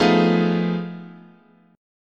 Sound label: F 7th Sharp 9th Flat 5th, chord